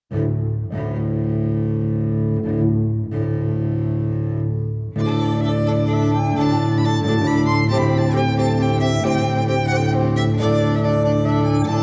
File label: Traditional, string quartet; Classical Quartet